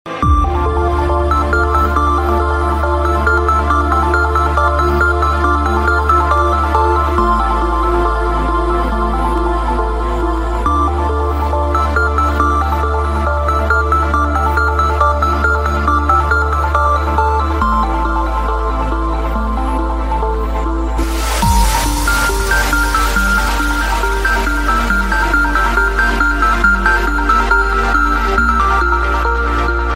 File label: Uplifting